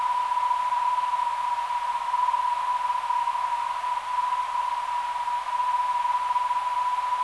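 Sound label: ZR 397kHz, non-directional beacon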